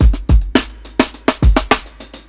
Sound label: 105 16th BEAT, drums; LOOPS; Basement drums vol 2